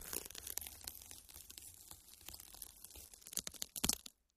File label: FLESH TEAR, gore